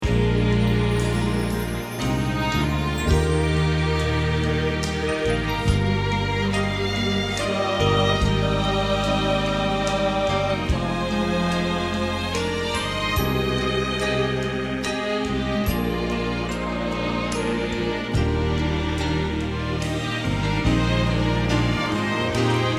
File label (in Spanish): Bajo